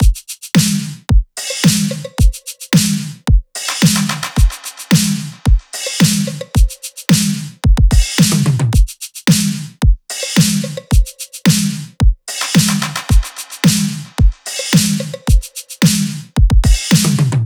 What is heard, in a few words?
drums 110, Drum Loops, Full Drums; Celica; Slate; MIDNIGHT Sample Pack